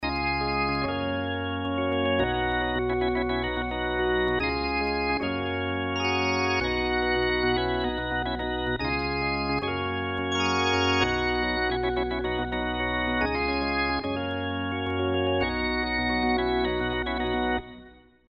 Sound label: organ cheap, Yamaha TG-500